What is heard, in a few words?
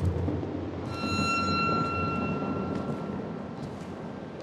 bark